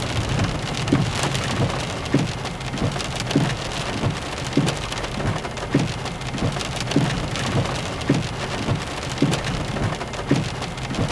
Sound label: car rain interior; best service Blue Box